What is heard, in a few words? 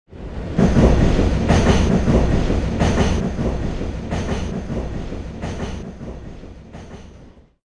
Point, train; OpenBVE